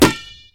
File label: Dodgeball Hit